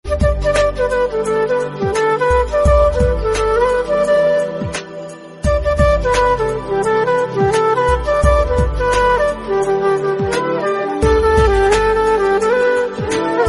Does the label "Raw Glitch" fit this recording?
No